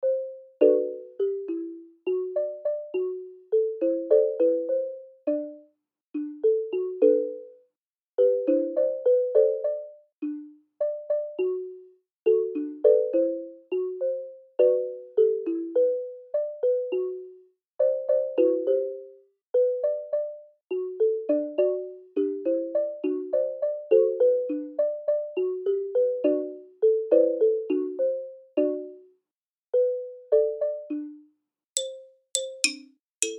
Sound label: XYLOPHONE